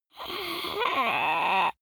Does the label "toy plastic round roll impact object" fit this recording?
No